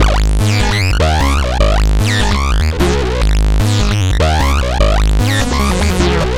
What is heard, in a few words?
Bass Loop